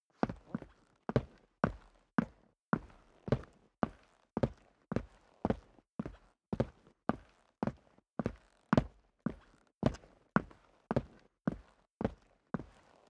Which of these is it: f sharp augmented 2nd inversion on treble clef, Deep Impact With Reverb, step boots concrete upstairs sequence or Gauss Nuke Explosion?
step boots concrete upstairs sequence